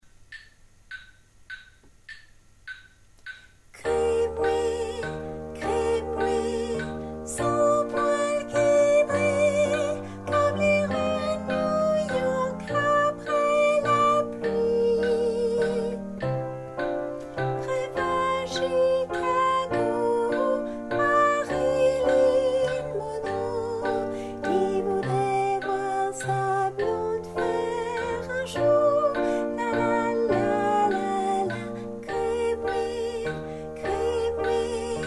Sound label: tenor